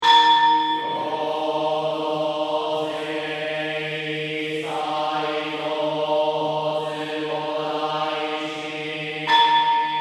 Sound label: Monk Buddhist Ceremony Drone Chant Males Bells Tokyo Japan; Buddhist ceremony, Tokyo, Japan. Monks chanting, vocalizing monoten; Chanting Sound Effects